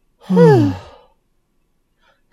both sigh; blindside

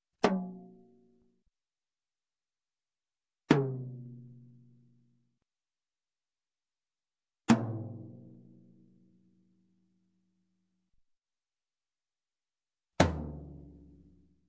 Drums, Tom Tom single Hit, Music, Percussion; Sound Ideas; Series 2000

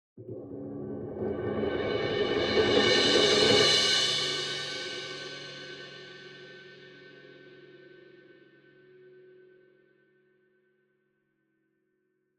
CYMBAL Crash Swell Soft Beater Near; boom library; Cinematic Strikes Construction Kit

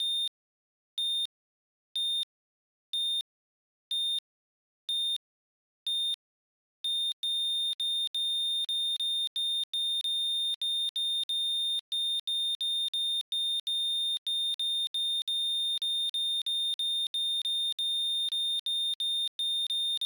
Heart Attack; Medical; Blastwave

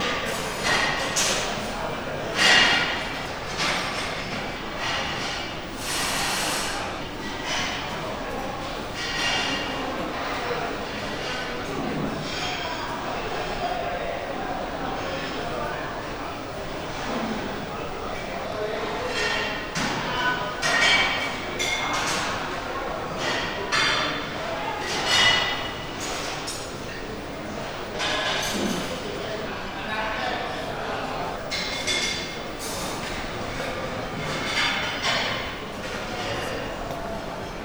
restaurant large near kitchen; best service Blue Box

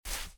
mat jump; firefight

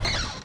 Door Wood Creak; Doors

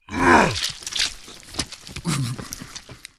zombie attack

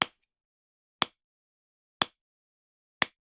Generic Button Plastic Small

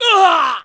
male pain hard; firefight

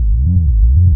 Scifi Synth Drone Loop; SFX Kit; Sci-Fi Loops

